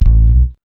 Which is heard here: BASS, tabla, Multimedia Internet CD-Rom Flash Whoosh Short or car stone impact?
BASS